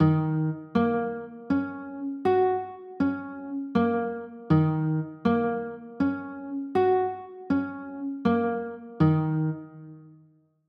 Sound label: e flat m7, chords